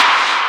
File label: Clap; Vengeance Samples